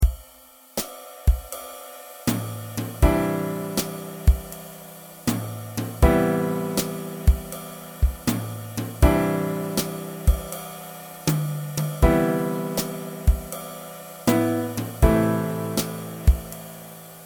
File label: smooth jazz